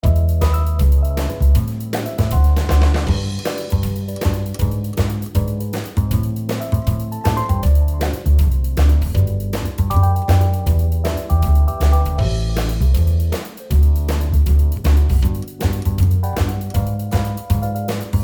158bpm backing

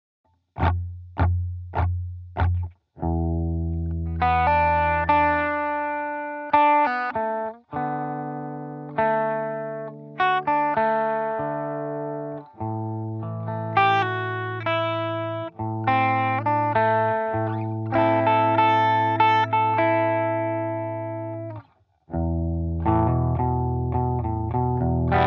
blues track

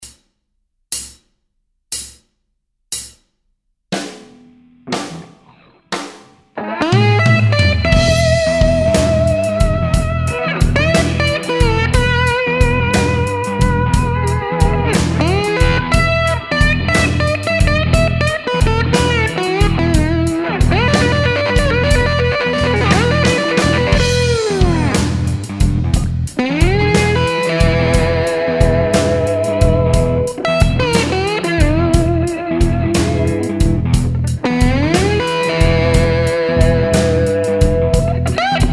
slide part 1 mix, guitar

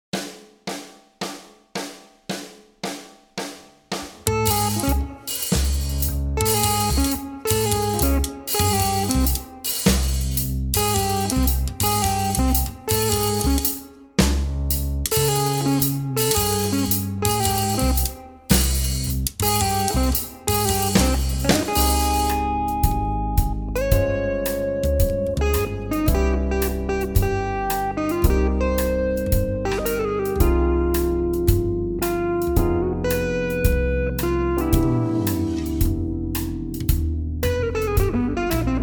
improvising mix, guitar

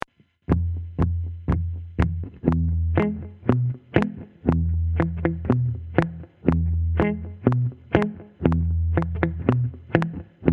blues Slow 120bpm

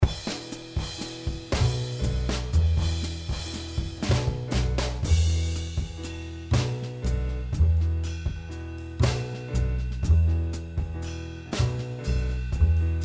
improvising backing

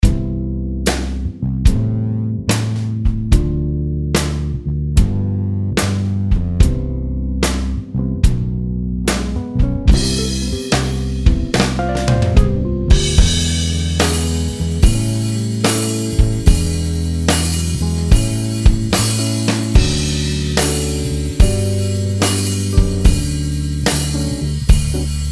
improvising backing, guitar

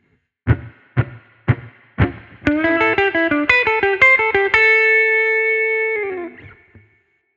blues track

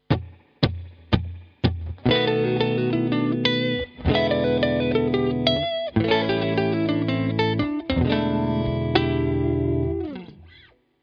blues, guitar

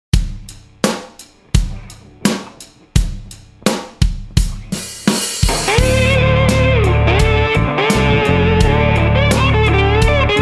slide mix, guitar

